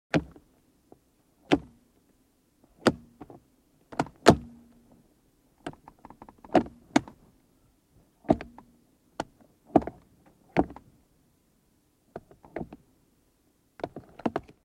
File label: BMW Floor Type Gear Shift Movement; Cars